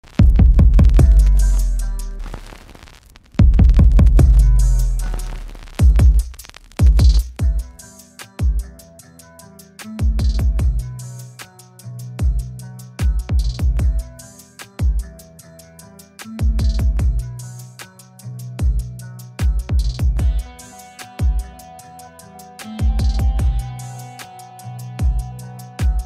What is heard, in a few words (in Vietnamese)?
nhac test loa trai phai cuc chuan bass